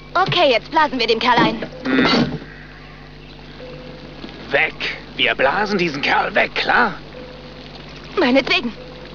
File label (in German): blasen